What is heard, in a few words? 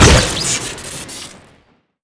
gun fire crit, weapons; tf2classic